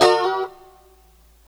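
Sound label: F 4 A mono slide R, guitars; Spectrasonics; Hans Zimmer Guitars VOL-1; 90's Sample CDs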